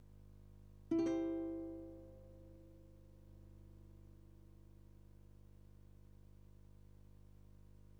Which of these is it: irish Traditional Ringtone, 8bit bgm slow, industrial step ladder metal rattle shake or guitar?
guitar